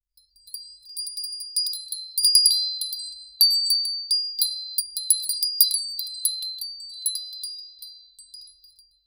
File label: chime bell soft wind, wind chimes